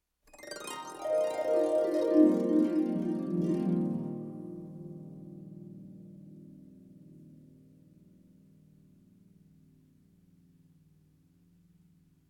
Konzertstimmungen Harfen Glissandi Abwaerts

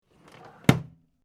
Schubladen

